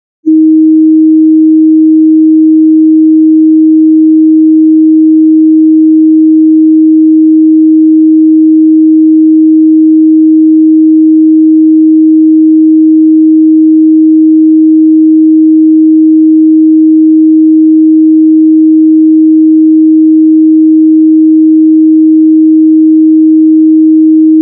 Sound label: 315hz Sine Wave 0db L+r; Denon Audio Technical CD